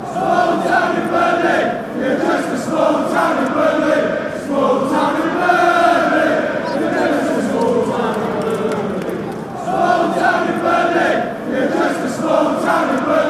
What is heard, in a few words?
small town, fan chants